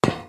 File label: footstep grate